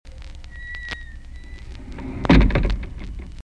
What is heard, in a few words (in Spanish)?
puert